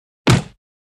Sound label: fights